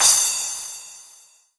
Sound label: Yamaha TG100 Splash Cymbal